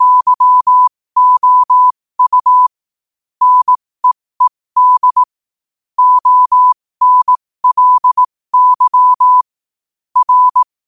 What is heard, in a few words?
5 wpm, morse